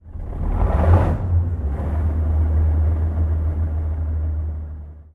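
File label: t90a engine interior start; engines; War Thunder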